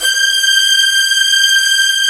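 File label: orchestra strings G1; GRANDSTRG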